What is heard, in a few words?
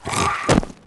Troglodyte Death Sound; Heroes3